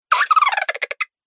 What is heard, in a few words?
Chipmunk Laughter; Cartoon Voice Sound Effects